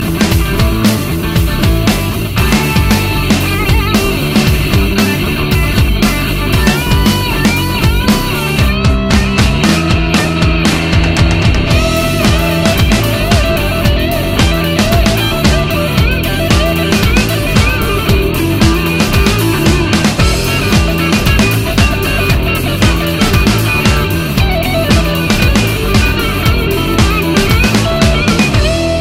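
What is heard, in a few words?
Lead and Bass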